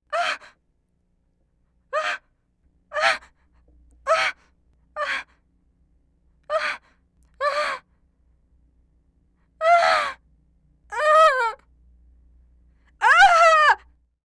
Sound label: moaning